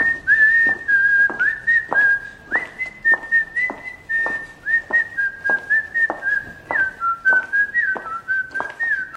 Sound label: lady whistling; kill bill; audio meme